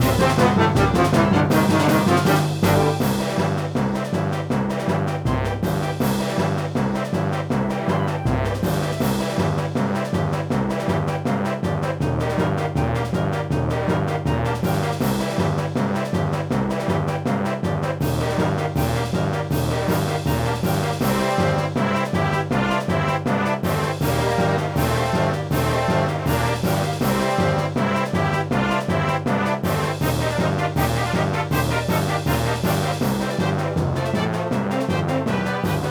Classical Stage Karaoke; Pinky Street Kira Kira Music Hour Pop Princess; Classical Stage 5

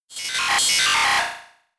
Screech

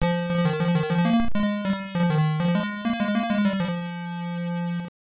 Victory Fanfare; Rampage; Master System

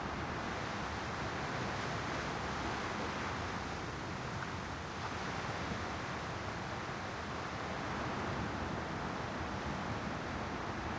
Amb Beach Baseloop

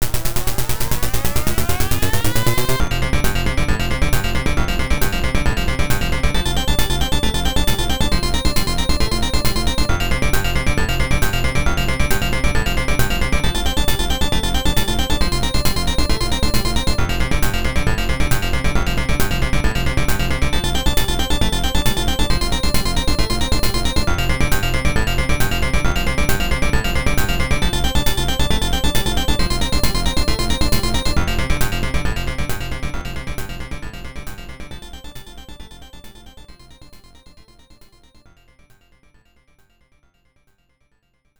Aircraft Carrier (Gradius Boss BGM); Garudius 95; PC-9801

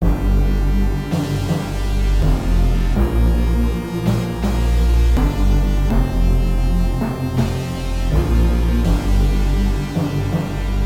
All Clear; Golden Axe The Revenge of Death Adder; System 32; arcade

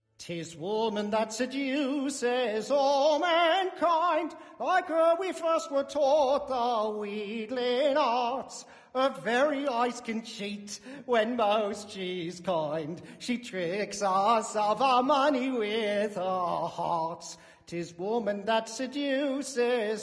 Opera Ambience; Assassin's Creed III

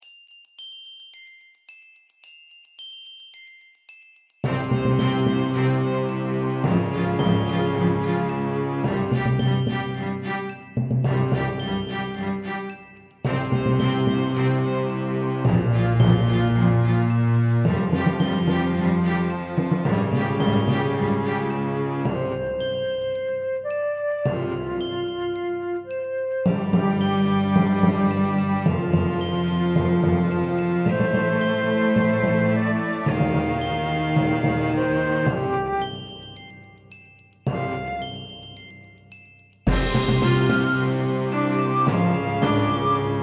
Volcano Hideout (Orchestra); dragon fantasy book i the volumes of westeria

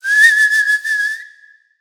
decoy whistle

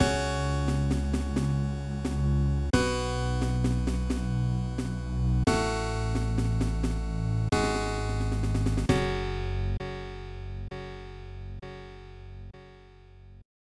Unknown Jingle; obitus; snes

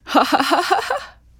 Female Evil Laugh

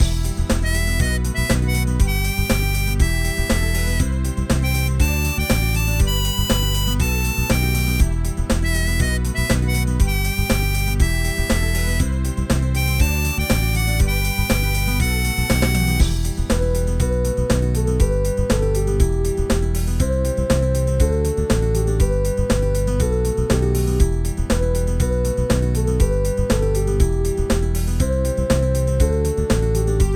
Folk; Metropolismania 2001 PlayStation 2 gamerip

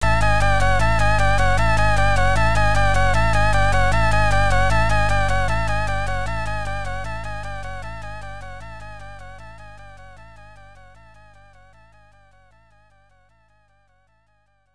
Time Out Warning; Tiger Road; Tora e no Michi; TurboGrafx-16

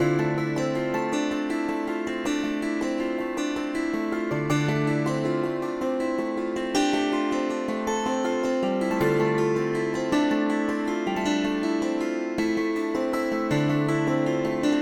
Dulcimer; Village Song

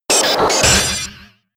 Metal Sound; Pokemon; Gen 5; Blk Wht Blk2 Wht2

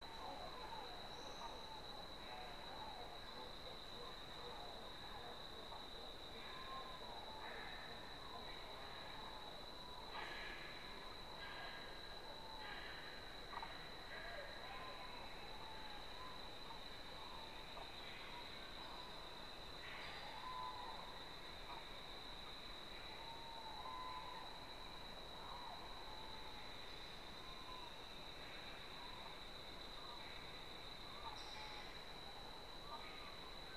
Jeep Chase amb

